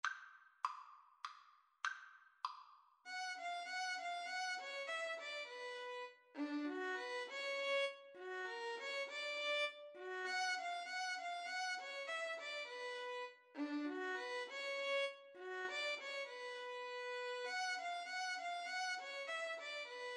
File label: fur elise, Violin Cello Duet; beethoven